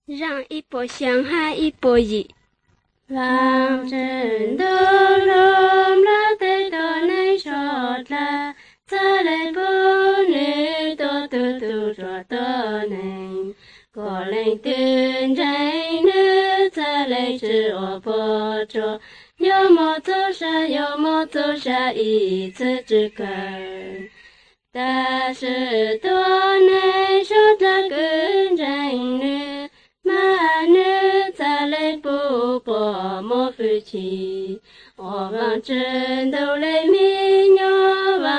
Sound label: songs hmong blue; bible